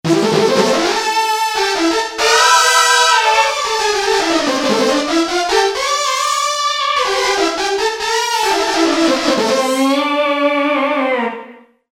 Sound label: Brass lead